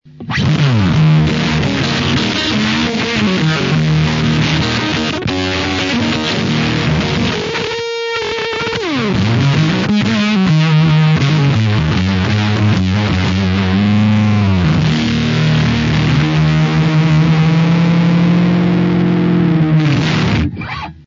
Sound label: earwig thick